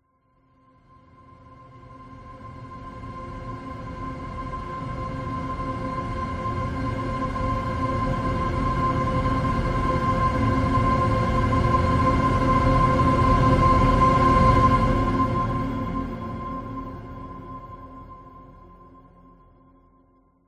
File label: horror riser tension airy light shrill